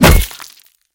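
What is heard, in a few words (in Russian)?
четким ударом поразили цель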